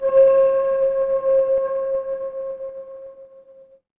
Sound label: instrument singing bowl